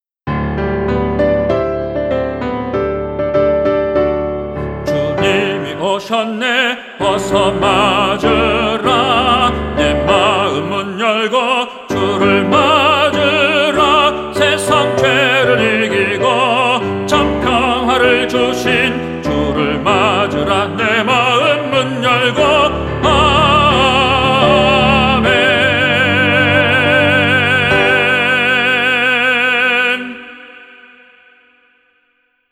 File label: TENOR